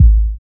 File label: DR. LOOP, DRUMS; X-Static Goldmine 3